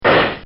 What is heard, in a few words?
Cartoon Sound Effects Bang; Cartoon Sound Design